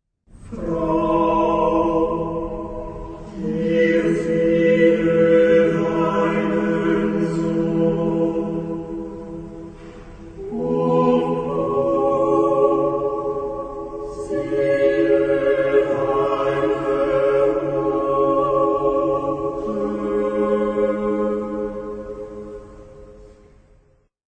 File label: Choir